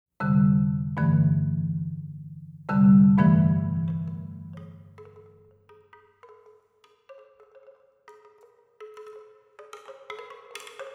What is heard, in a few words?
marimba and woodchimes